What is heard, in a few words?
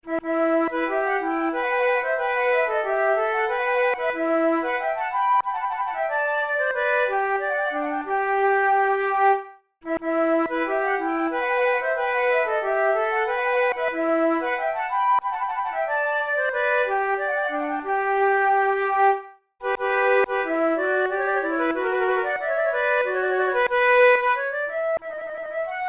two flutes courante a la francaise; Monteclair; 6 concerts; flute tunes